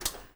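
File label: blinker switch off, volvo 9900; Freyfurt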